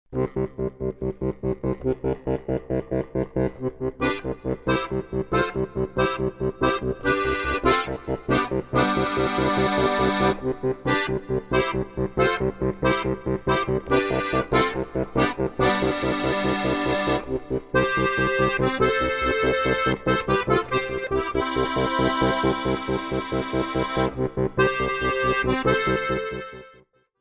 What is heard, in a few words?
Harmonika Slash